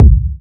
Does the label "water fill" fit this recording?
No